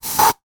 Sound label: household womans antiperspirant aerosol can spray